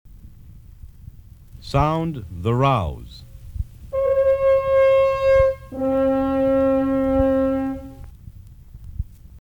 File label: The Rouse Bugle